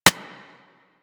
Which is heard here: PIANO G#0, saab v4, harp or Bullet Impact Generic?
Bullet Impact Generic